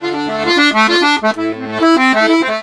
Accordions; USB Soundscan vol.40; AKAI

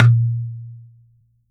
coconut; Unique Instr

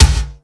MONDO PERC, METALIC DRM

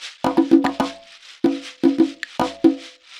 Percussion, cinematic funk; Sonic Foundry (Sony Creative Software); Cinematic Funk Urban Tone Poems

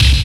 kick delay, dance kicks; Roland L-CD701; Dance K1

